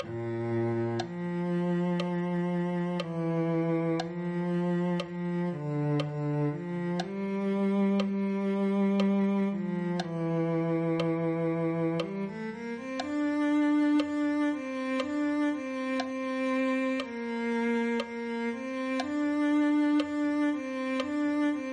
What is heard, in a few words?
cello